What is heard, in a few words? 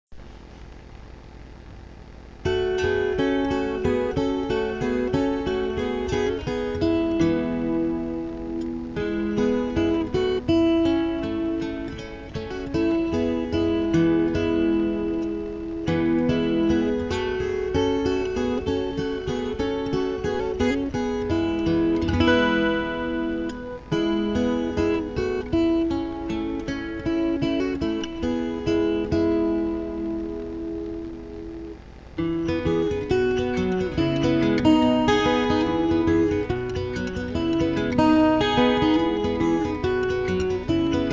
guitar sampler